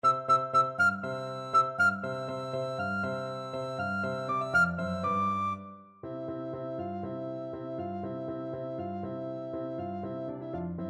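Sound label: vivaldi autumn 1st movement recorder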